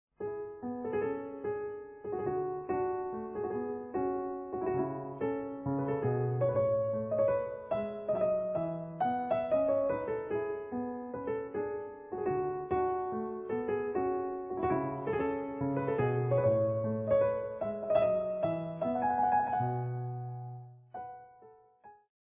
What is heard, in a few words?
Menuet